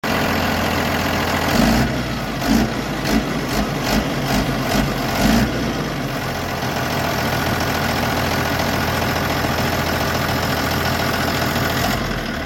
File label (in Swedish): Traktor